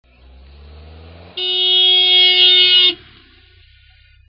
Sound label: horn